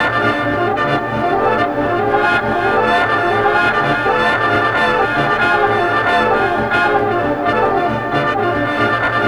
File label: SWINGIN, Synthy Tonal; Distored Reality